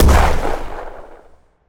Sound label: explosion small